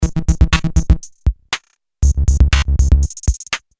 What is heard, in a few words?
bg music